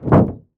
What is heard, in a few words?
car hood drop